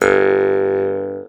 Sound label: MT G, JHARP G B; Xsample VOL-9